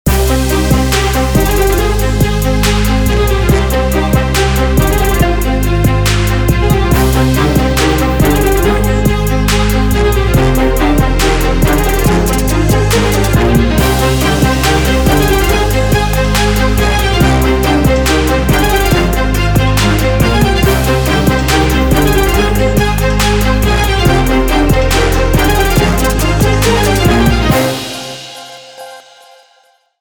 CLASSICAL TRAP BANTER

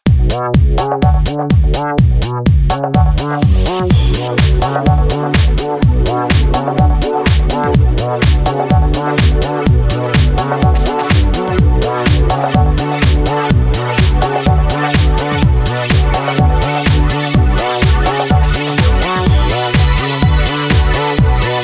PING PONG SLAP

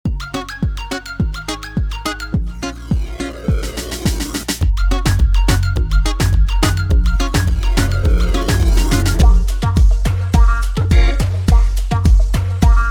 DIGITAL REGGAE-LUTION

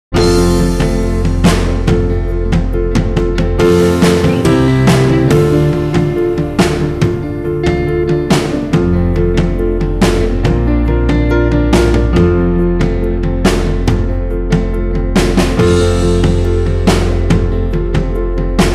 instrumental

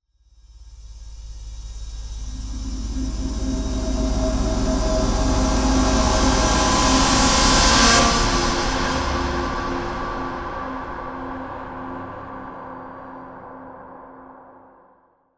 SCARY TRANSITION

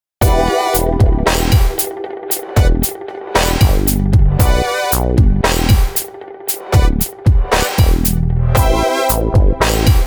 ORGAN MOANER

